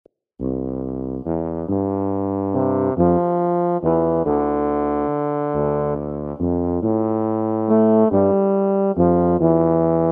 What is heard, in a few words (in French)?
petit duo en ut pour tubas